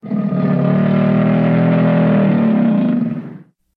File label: Monster Scream